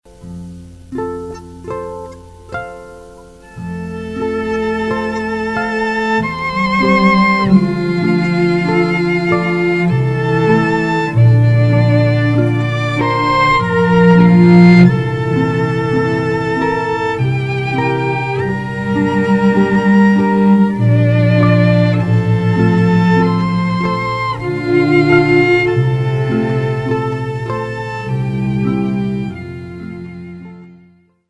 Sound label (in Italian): arpa e violini, arpa per matrimonio